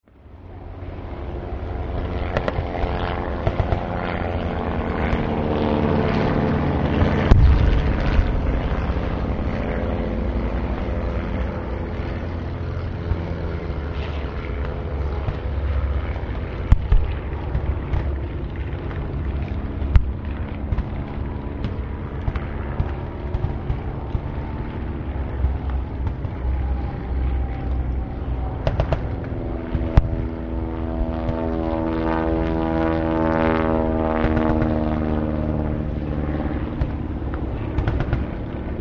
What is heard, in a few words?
WW2 Battle Distant; Field Fire; Battlefields; War